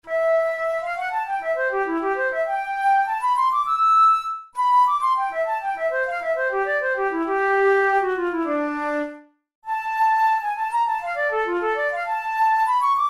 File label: study in c major, flute tunes; kohler op33 no1 study no1